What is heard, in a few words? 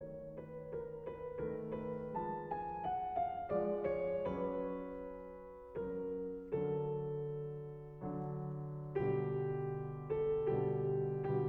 Adagio in a (piano); Mozart